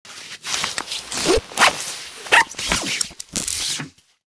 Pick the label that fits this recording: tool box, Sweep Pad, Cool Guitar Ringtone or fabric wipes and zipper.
fabric wipes and zipper